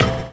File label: BDRUM; E-Lab; X Static Goldmine 2; akai